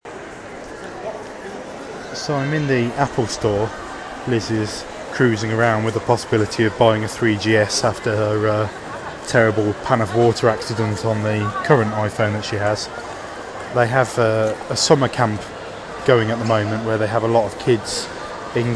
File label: rain